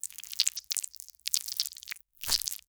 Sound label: wet squish sound like a, parasite